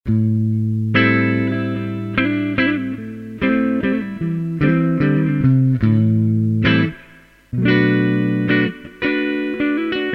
tesla shark neck clean

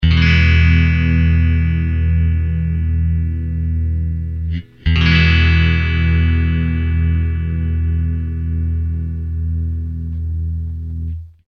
smoke stack ii clean bridge coil

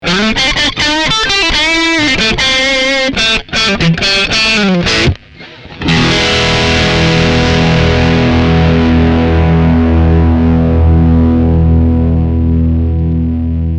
pig iron dirty middle

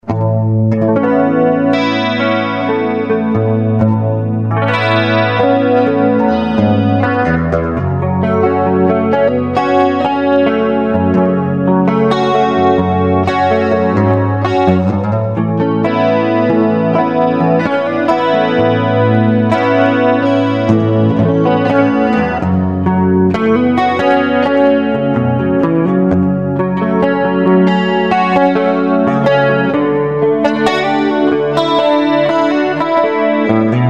neck clean

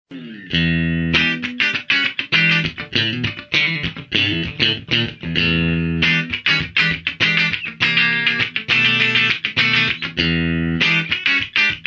texas loco bridge clean

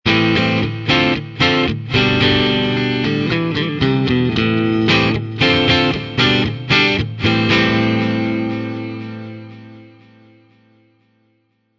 platinum 90 neck clean

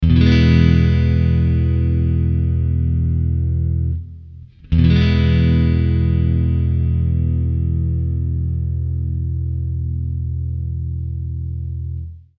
vintage clean neck; vintage 6ts

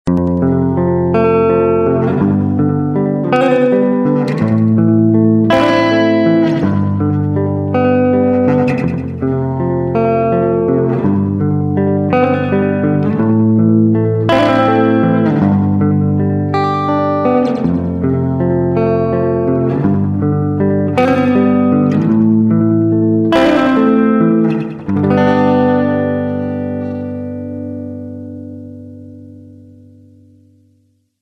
hammer head neck clean

